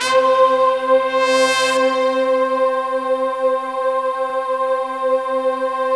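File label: brass, slow brass choir; Optical Media International - Sonic Images Library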